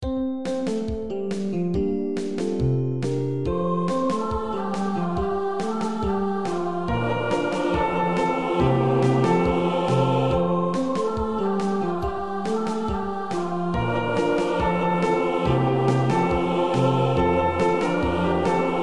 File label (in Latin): gloria soprano fast; paulusfest